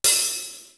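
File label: hihat open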